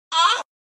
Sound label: Death Sound; C3PO